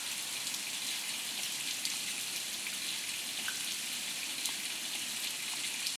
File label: Ambience waterfall